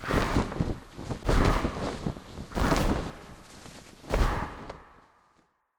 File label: harpy queen idle; monster